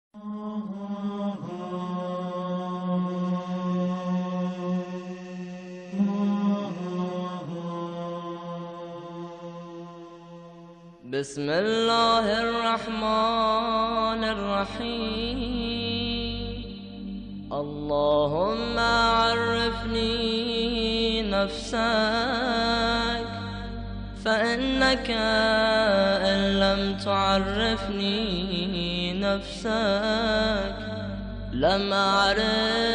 Dua Allohomarfani; دعای اللهم اعرفنی

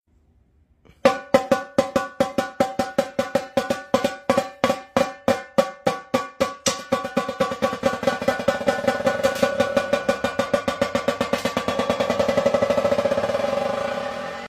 Kassoora, ساز

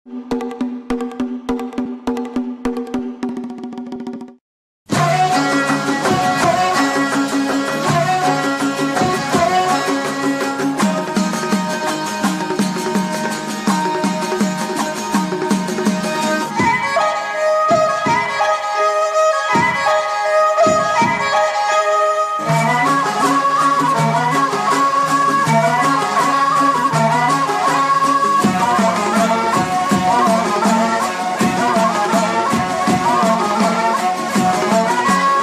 deserkoten

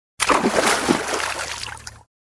Falling fruit in water

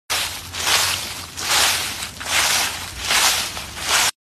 Walking on dry leaves

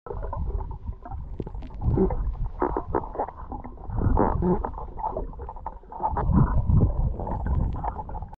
Diving mask with capsule; ماسک غواصی با کپسول

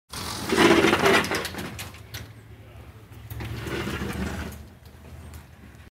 Sliding door

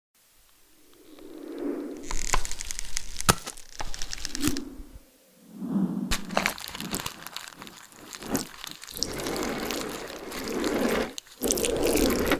marker on paper

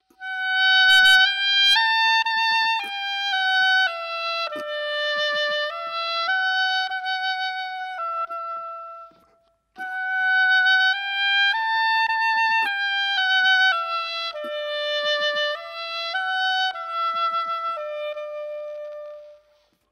OBOE SOUND; ساز ابوا

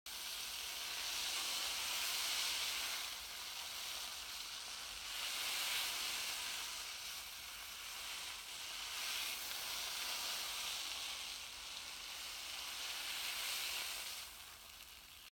crawling

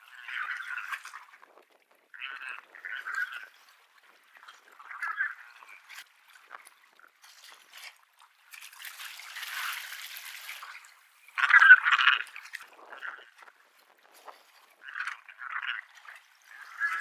Albatrosses; پرنده آلباتروس

